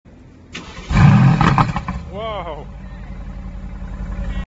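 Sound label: stand up exhaust sound problem coupe; 2019 gtc coupe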